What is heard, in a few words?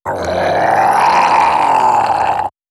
zombie growl